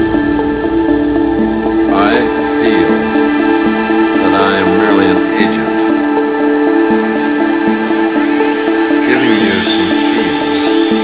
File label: keys